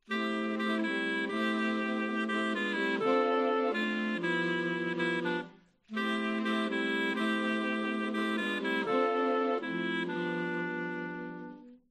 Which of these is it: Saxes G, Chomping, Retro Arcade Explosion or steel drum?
Saxes G